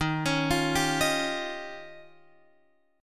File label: D sharp 9th D sharp, Guitar Open F; D#9 arpeggiated; JGuitar